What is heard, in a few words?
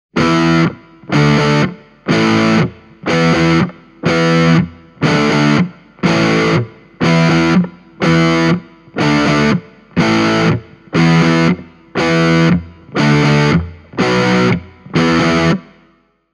Orange Crush 20RT overdriven